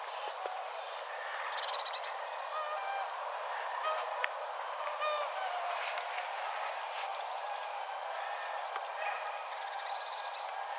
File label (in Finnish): tiaisparven aantelya; tiaisparvi